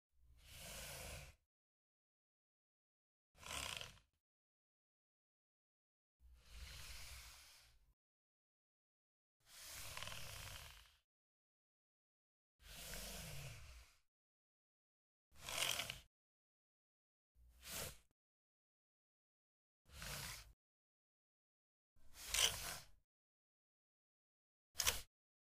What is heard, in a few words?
Computer mouse movement